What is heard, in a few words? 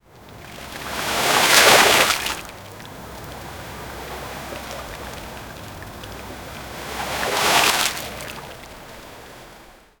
water splash fishing cast, light breeze